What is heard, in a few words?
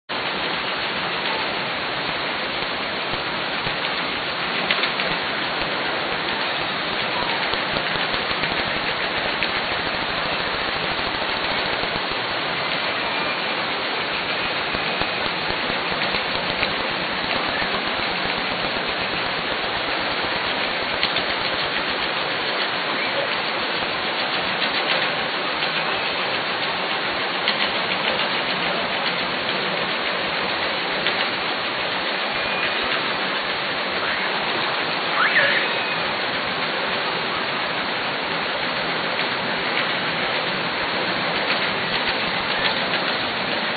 ship noise calls